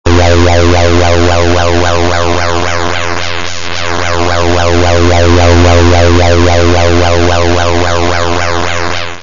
poly lfo

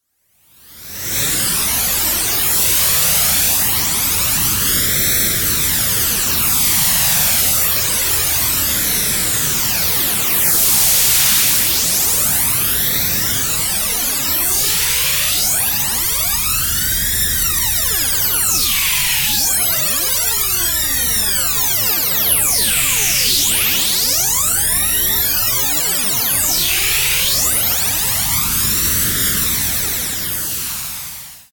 white Noise Stereo; Euro Dual Phaser

